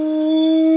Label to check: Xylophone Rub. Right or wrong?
Right